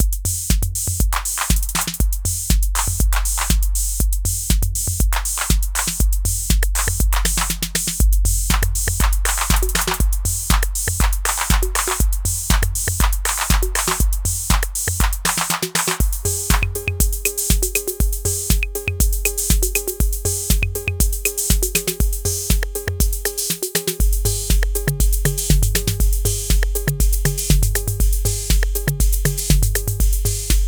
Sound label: Roland TR 08 Drum Loop